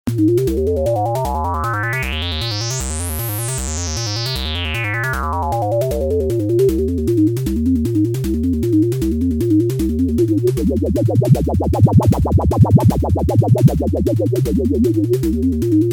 Nord, class rock

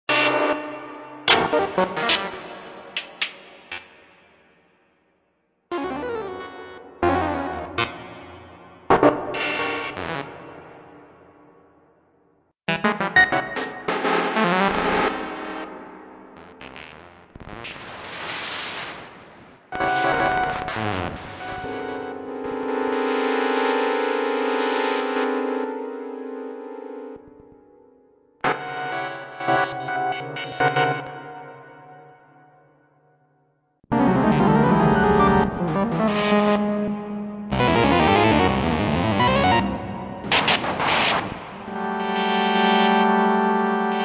RCA Synth Long; Reaktor

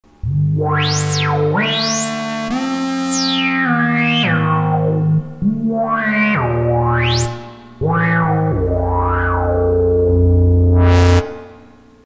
Filter; pogomoog

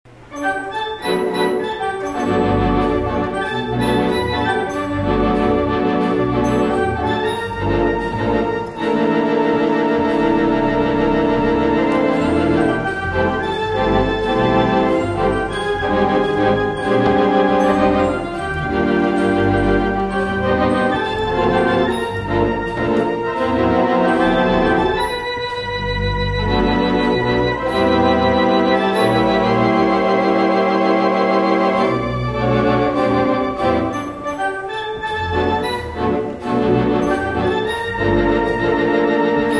Wurl, wurlitzer

